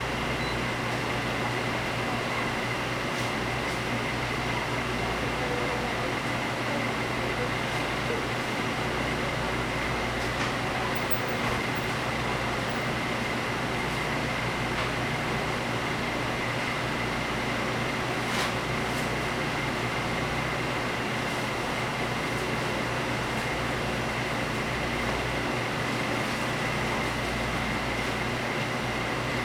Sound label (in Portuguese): Motor de geladeira e freezer no mercado; sao jorge goias